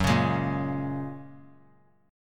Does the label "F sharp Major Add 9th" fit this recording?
Yes